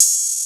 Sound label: Misc Hat, Drum Machines